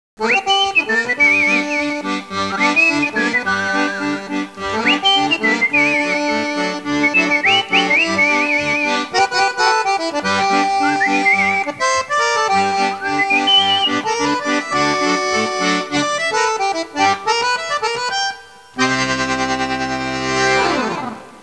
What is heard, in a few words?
suonerie musica traditional usa country; american